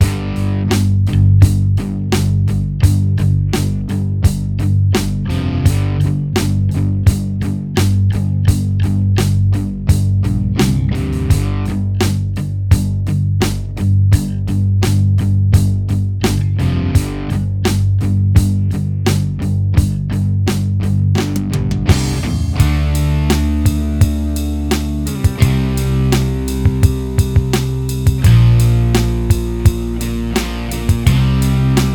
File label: Backing Track